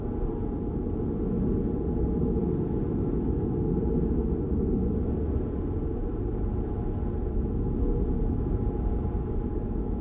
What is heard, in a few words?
Warp; blue archive